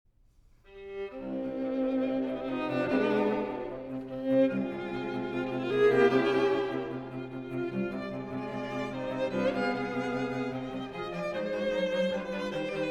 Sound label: String Quartet No. 4 in C Minor, Allegro ma non tanto; Ludwig van Beethoven; Beethoven - String Quartets No. 1-6, Op. 18